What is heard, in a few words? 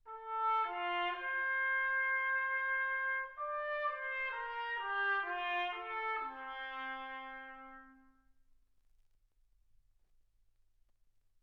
trumpet